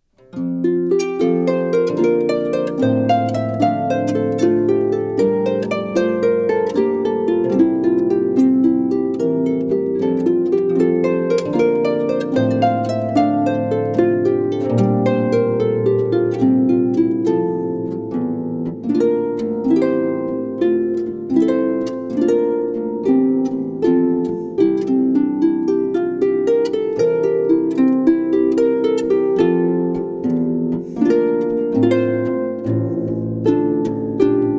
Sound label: harp; harp-samples